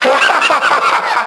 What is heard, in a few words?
laugh 8 bit evil man; ooh ahh